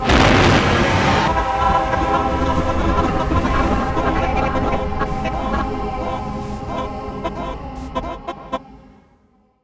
game over; svencoop; morass beta

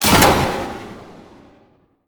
ending text; svencoop; morass beta

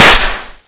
gun; svencoop

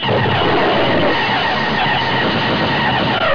ufo beam; svencoop

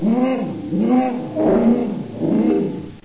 baby panther idle; svencoop; custom monsters

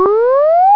ufo alarm; svencoop

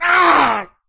pain; svencoop; st allies; crimson commando

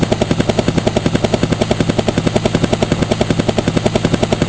fan; svencoop; breakfree3

